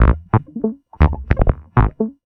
Synth Bass

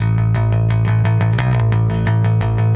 Electric Bass

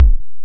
Kick, Drums & Percussion One Shots Drums

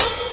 HiHat; Drums & Percussion; One Shots Drums

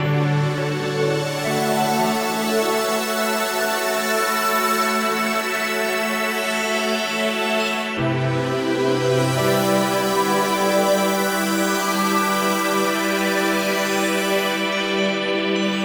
Pad

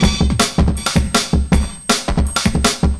Breakbeat, Drums & Percussion

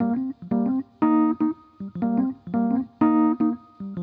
E. Piano

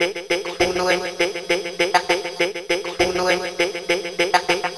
Processed Vocal

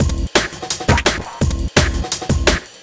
Breakbeat, Drums & Percussion

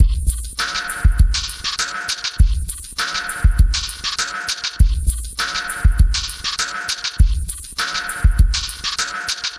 Hip-Hop Drumloop

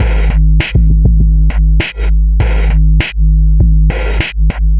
Electronica Drumloop

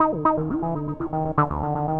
Synth

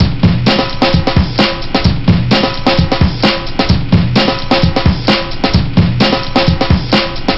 Acoustic Drumloop

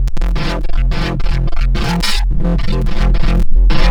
Synth Bass

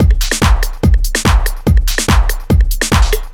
Dance Drumloop

